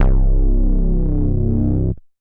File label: Orion Bass; Ableton; Factory Packs; Chop and Swing